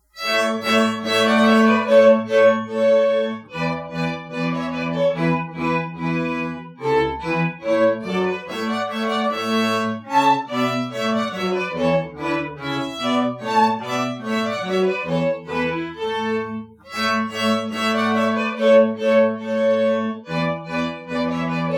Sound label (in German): streicher lasst uns froh und munter sein